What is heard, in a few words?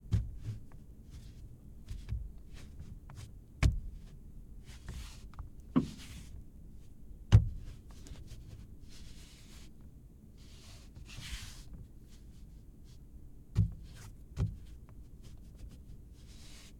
Mercedes AMG GTR - Hand Movement on Steering Wheel; Pole Position; The Vehicle Doors and More Library